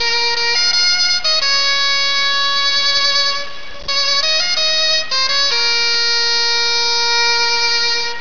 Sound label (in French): bombarde